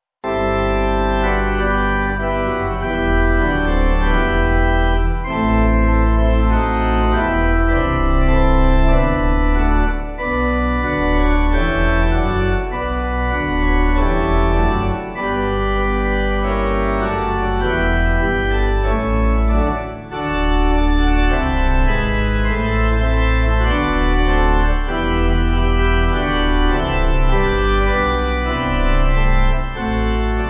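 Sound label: Pipe, Pipe Organ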